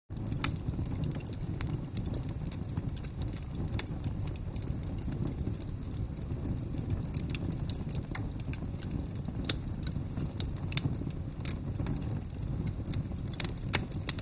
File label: FIRE FIREPLACE: INT: Fireplace with light crackling & roar, close up, distant intermittent bird calls.. Fire Burn.